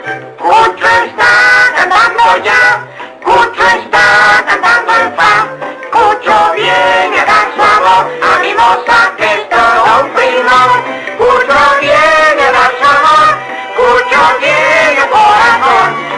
canta en fa

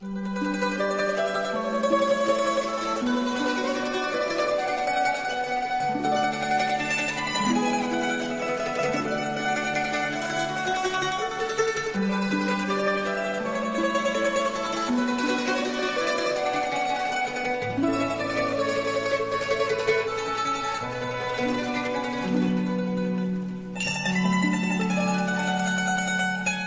Arpa, ringtone

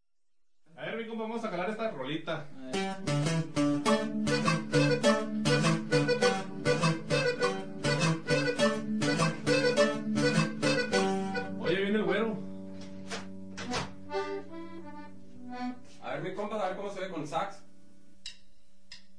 cumbia version nortena

